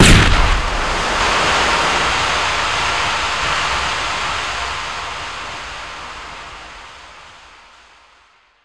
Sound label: grenade smoke; weapons; Modern Warfare